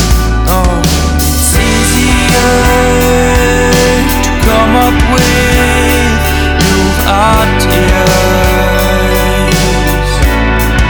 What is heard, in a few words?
Rock Music Track